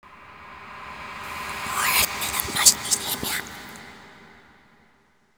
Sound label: reversed; slender